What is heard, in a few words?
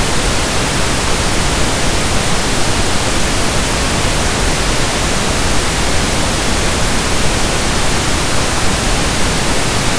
pink noise full band